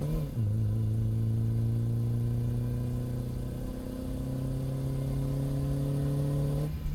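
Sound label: engine high loop; Blind Drive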